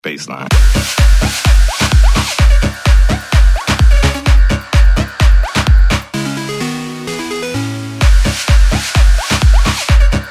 bass line

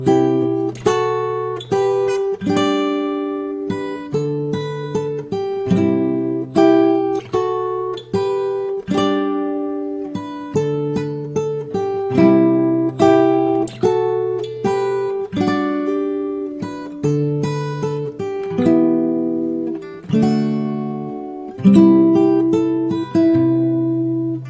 guitar; hunger games

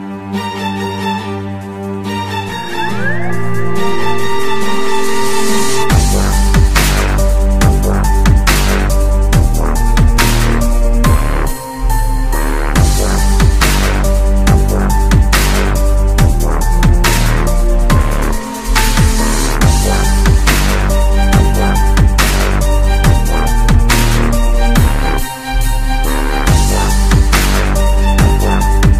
electric mashup

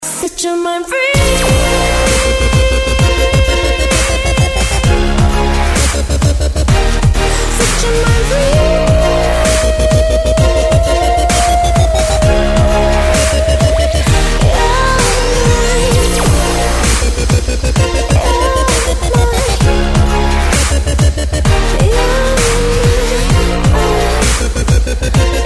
dubstep remix

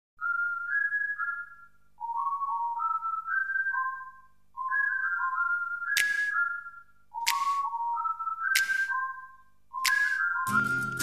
arabian whistle

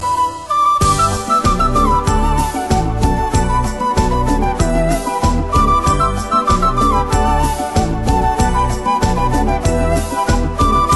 flute

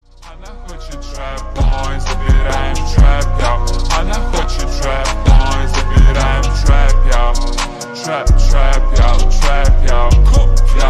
slowed reverb

